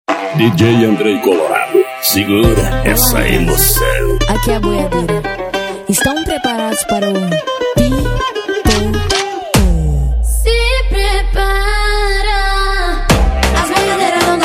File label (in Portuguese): sertanejo com locutores de rodeio